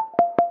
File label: SMS; Orion; toque de celular